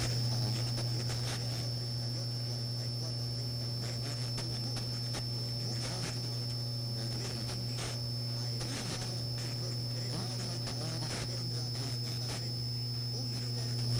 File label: Radio; Blind Survivor